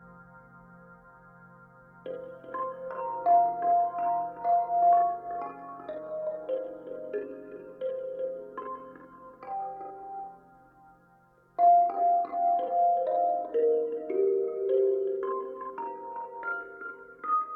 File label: Music Corridor; the swapper